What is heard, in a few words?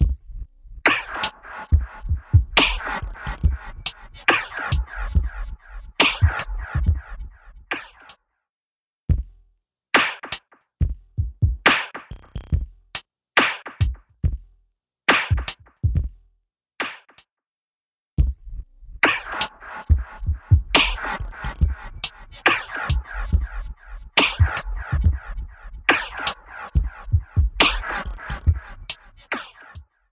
Snare; Physion MkII; Preset: Tron's Deadly Disc Snare